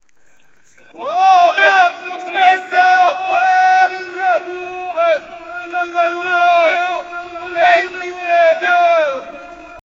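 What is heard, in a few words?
sounds of a arabian battle, arabic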